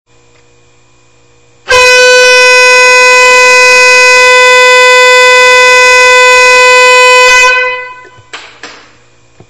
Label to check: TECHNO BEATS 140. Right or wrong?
Wrong